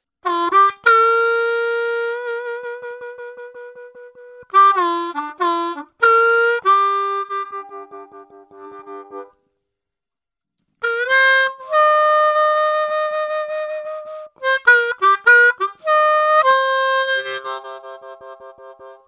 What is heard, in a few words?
Honey C, harmonica